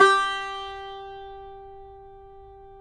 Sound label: CUMBUS G, Guitars; Spectrasonics; Hans Zimmer Guitars VOL-2